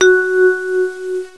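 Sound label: note G flat 3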